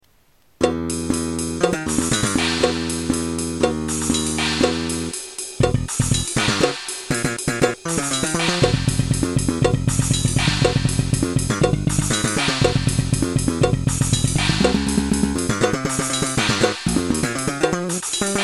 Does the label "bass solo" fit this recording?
Yes